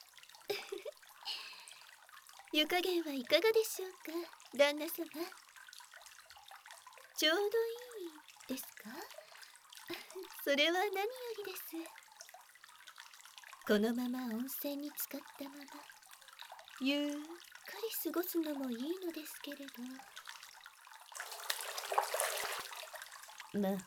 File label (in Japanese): 触手音 お風呂 で 泡 耳 触手責め（バイノーラルマイク 効果音大きめ）; 触手音Nomal